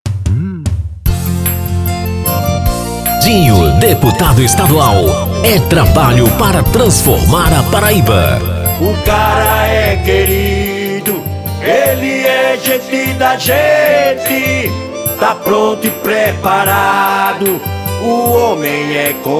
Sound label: jingle